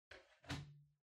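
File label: Ceiling Light (Far remote control Holder Put In)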